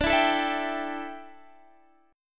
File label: D Suspended 2nd Suspended 4th, Guitar, Standard; Dsus2sus4; strummed; x,17,14,14,17,15; down; capo=7